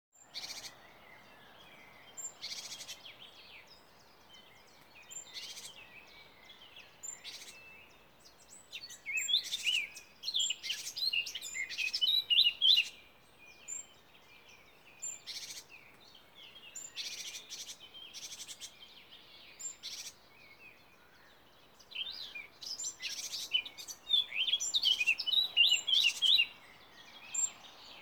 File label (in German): Vögel